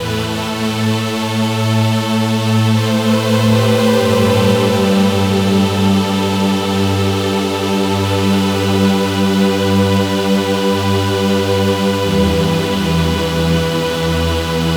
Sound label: Pad, Trance; Sonic Foundry (Sony Creative Software); Trance NRG VOL-2